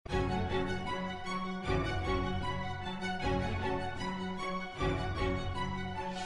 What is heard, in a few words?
Dark Orchestra